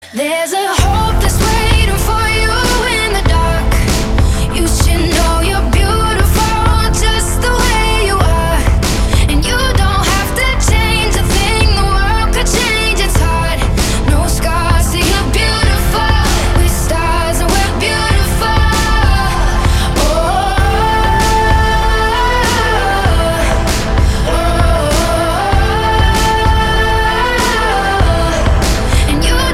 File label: Ringtone